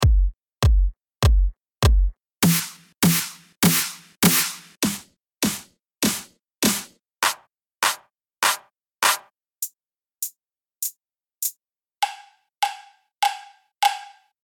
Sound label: Drum